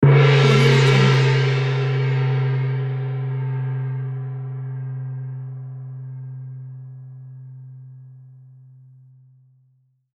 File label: gong